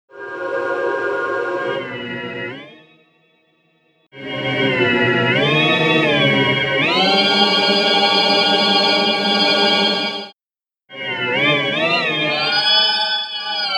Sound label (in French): exemple organ; physical music; time freezer